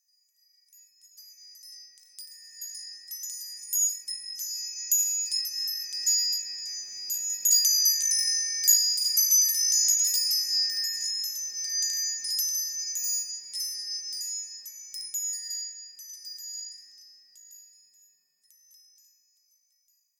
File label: Windchimes; Plant Tycoon